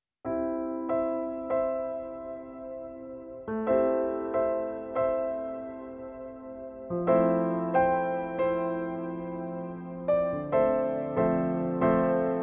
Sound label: Jingle Bells